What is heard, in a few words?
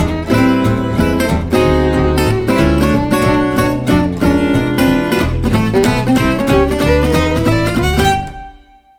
swing audio mixed mastered, guitar; zoom h6; home recording for gj guitar